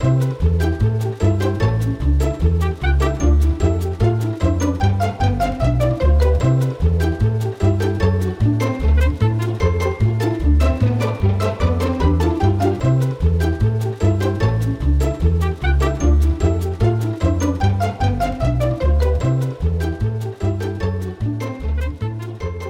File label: Round Break; Jackbox Games; The Jackbox Party Pack 1; YDKJ